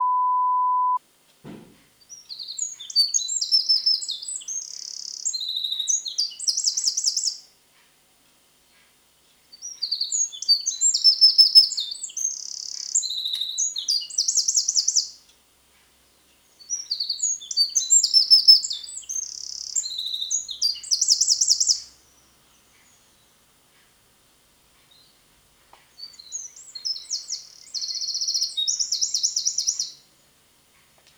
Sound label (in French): troglodyte mignon